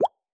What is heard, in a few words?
Bubble Navigate; MenuSFX